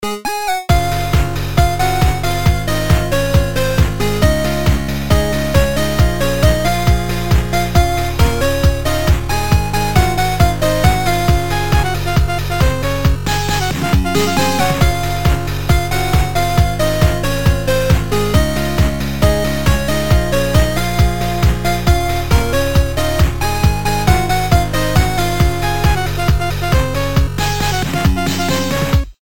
8bit

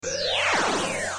Warp

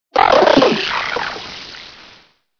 Jumping Frog Tone

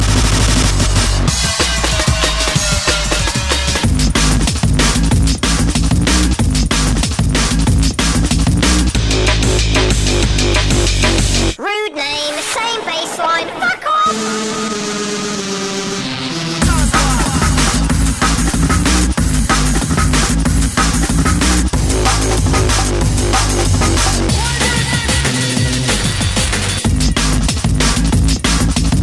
Dnb

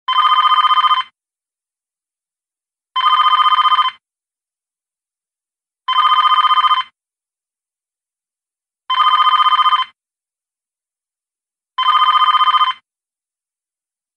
office ringtone high

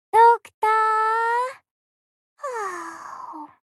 YAWN

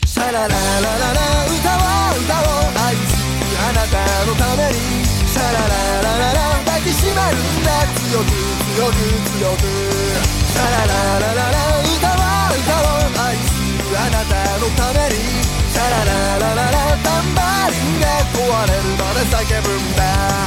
Shalala Loop

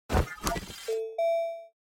Backpack; Astroneer

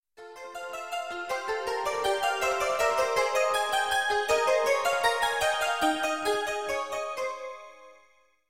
Tokyo Station Song

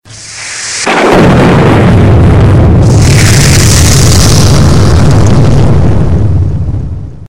Big Bang Bomb

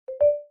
PC message; Wechat

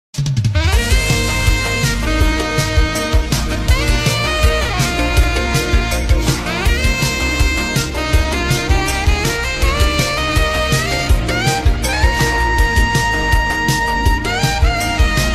Vaporwave Sax; Doggo

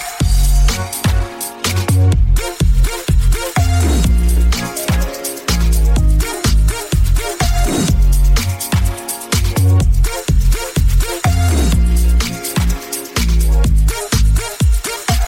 Shimmer; Fortnite

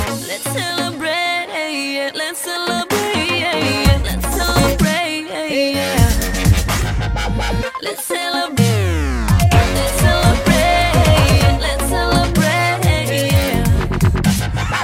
Dubstep Celebration

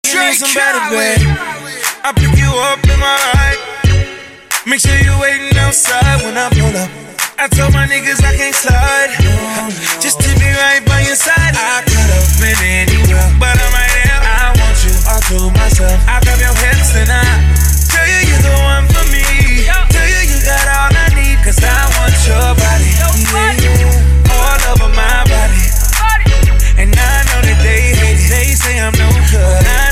low pitch

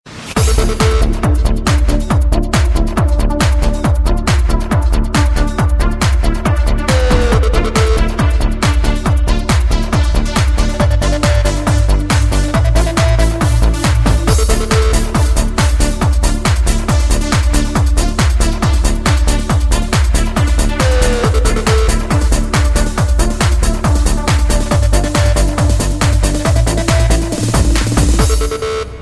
Electronic Tech Song

